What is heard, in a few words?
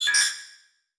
Multimedia Internet CD-Rom Flash Beep Multiple